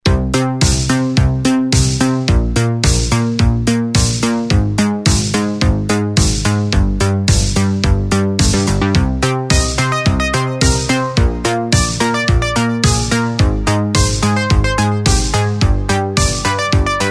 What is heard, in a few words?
flute cries